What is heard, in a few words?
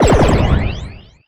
Pickup Scifi; SFX Kit